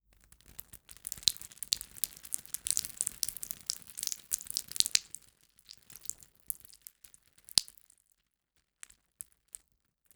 GORE - Blood Splatter Concrete Small; SoundMorph

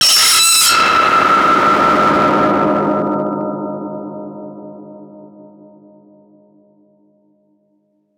Impact Distortion; MatiasMacSD; MENTAL STRAIN

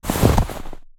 snow footsteps walk; Bluezone

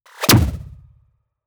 Crossbow -Arrow Hit; SoundMorph; Future Weapons 3

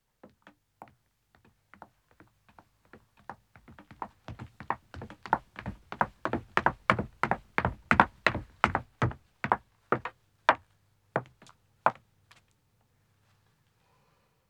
Wooden Stairs Descending, 1 Wo, Footsteps; BBC Sound Effects Library; Footsteps II

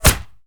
bullet impact body thump; Gamemaster Audio; Bullet Impact Sounds

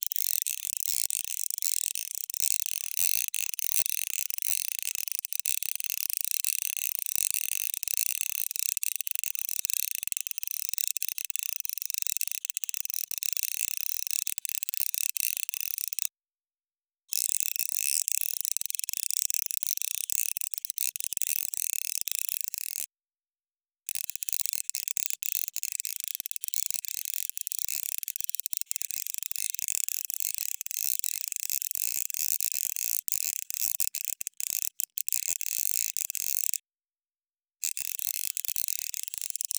small gear plastic fast; Mindful Audio; Elements - Gears

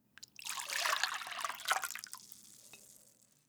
Pouring Liquid in to bowl; Glassware; Wav Junction Sound Effects

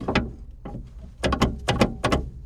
Valentine Tank Gearstick First; Mark IX DD 1940s

